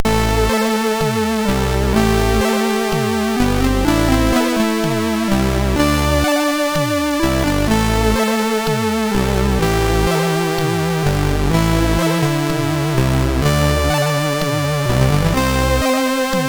Short Tune sid MOS6581R3

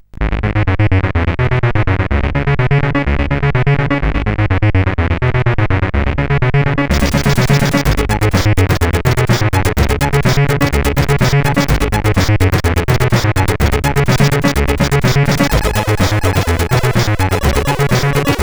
Distortion CSG8580R5; sid

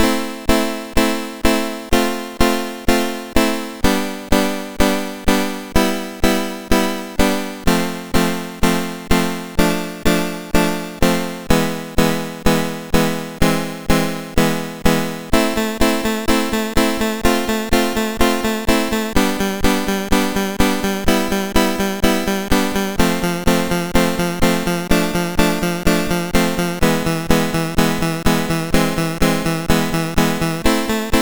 Piano Work sid CSG8580R5

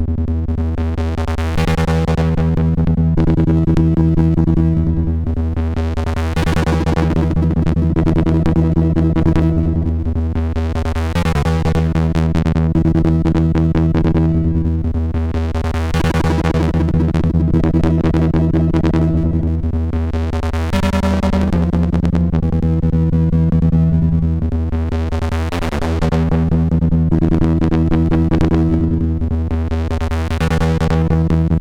Loop, MOS6581R4; sid

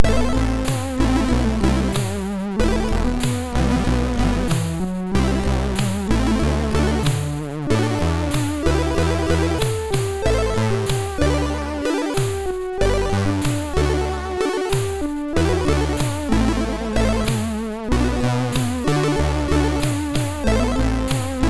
MOS6581; sid

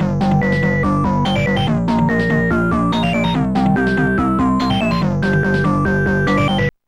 rock; rock four; Instant Music

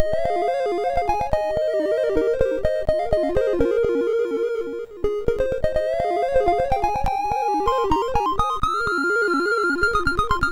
sid MOS6581R2

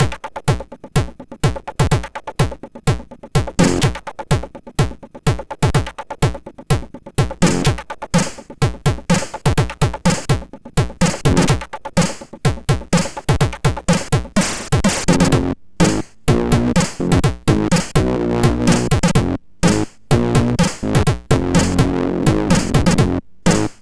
Heavy Introtune, MOS6581R4

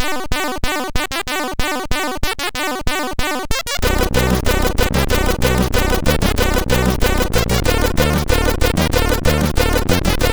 Techno, MOS6581; sid; MOS6581R3

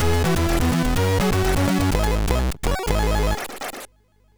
sid MOS6581R3

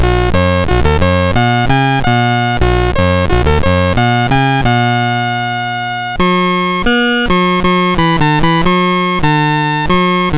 Dog Fight BASIC, CSG8580R5

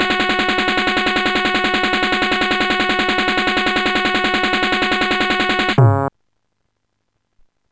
drumroll; Instant Music

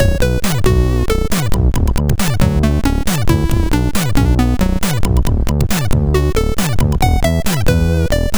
sid MOS6581R2

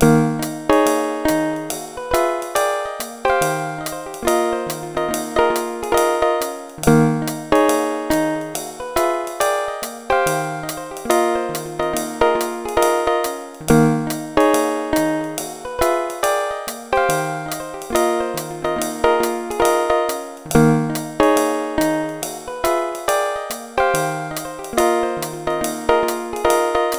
solo; Dr. T's MIDI Recording Studio

